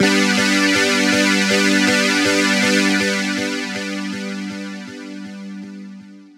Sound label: Techno 3 4 Motion; jup8